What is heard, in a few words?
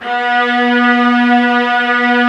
Vla Lg B2; Multisamples; Digital Sound Factory Virtuoso